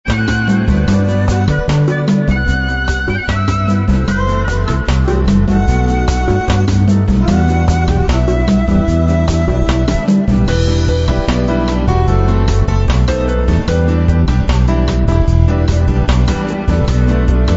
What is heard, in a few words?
Cool Samba